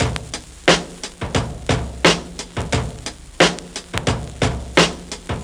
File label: Break 90s Vinyl 88 bpm, Drums; Loops; Ableton Live 12 Lite; Core Library